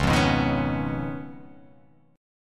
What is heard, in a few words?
C sharp 9th C sharp down, chord